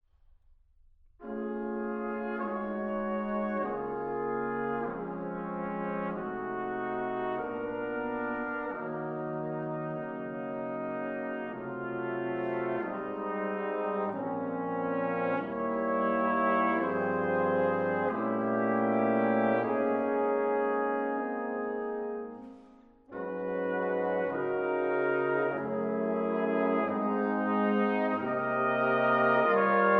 Brass Corale; Incisioni - Five Engravings in Brass - Mvt. 4. Corale secondo

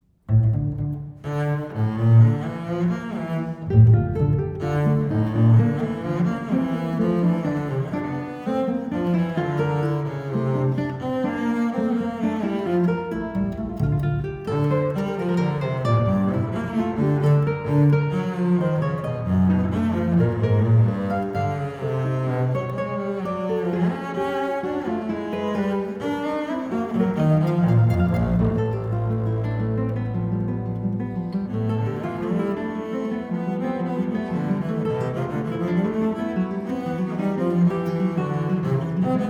String Bass and Guitar

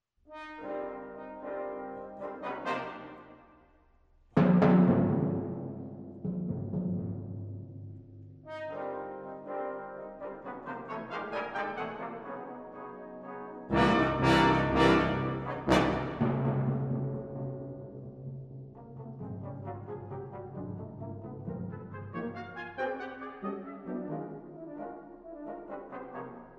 Symphony for Brass and Percussion, Vivace

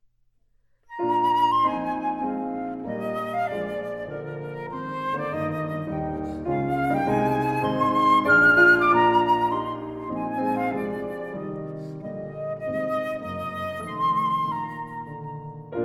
Clarinet Sonata In E Flat Major, Andante con moto

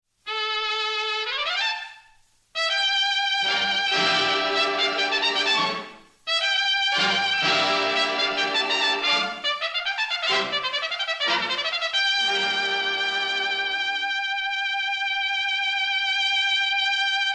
Samba, Trumpet

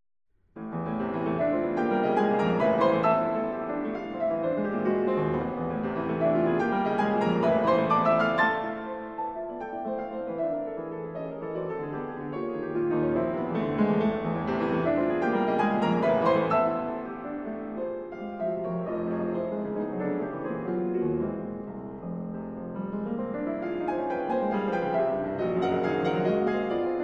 Preludes, Allegro Agitato, Piano; 20th Century American Piano Music

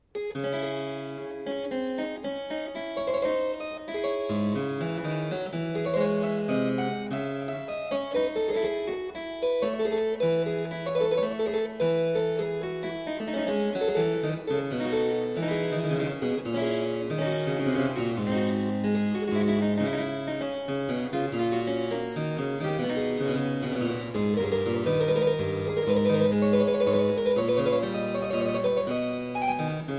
Suite I in D minor Courante, Harpsichord; Music for Harpsichord

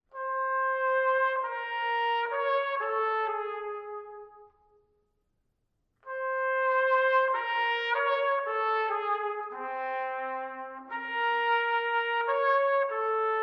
Three Etudes for Solo Trumpet; Mvt. 1. Karyokinesis

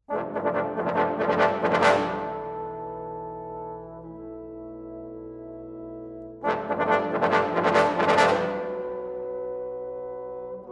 Concerto for Bass Trombone and Trombone Ensemble

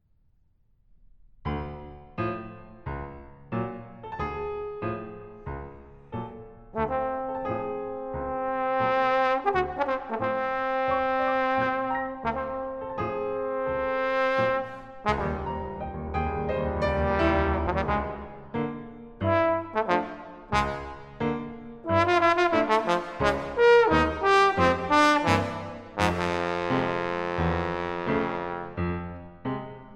Sonata for Trombone & Piano - Intermezzo- Gavotte; American Voices Vol. II; (Homage to Prokofiev)

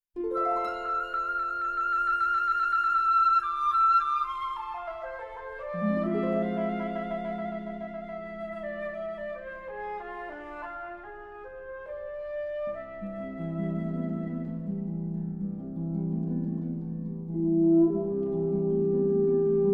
Esquisse For Horn, Flute And Harp

